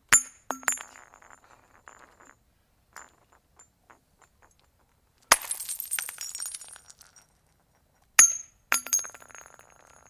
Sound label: Glass Bottles Rollin